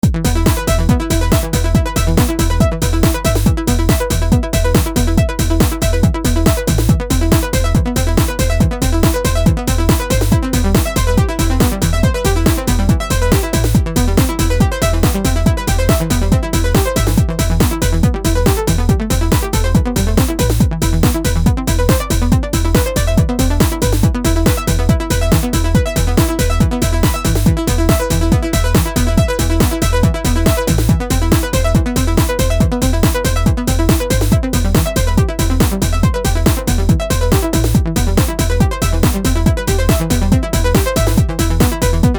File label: phrase arp; p80; superwave